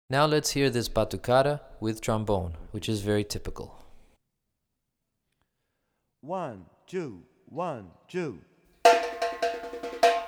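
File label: Batucada with Trombone, Brazilian Rhythm For Drumset; DaFonseca, Weiner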